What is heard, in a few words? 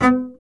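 string contrabass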